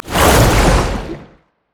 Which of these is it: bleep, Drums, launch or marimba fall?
launch